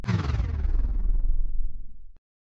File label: stutter; sorcery